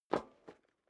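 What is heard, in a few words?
step dress boots metal dry single stair down, footsteps; schritte; menschen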